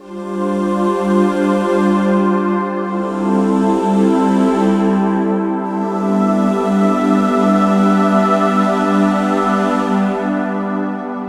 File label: String Loop